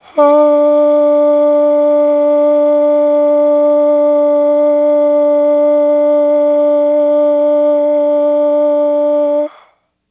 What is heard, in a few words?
AHHH, Vocal; Polyend